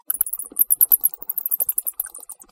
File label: bat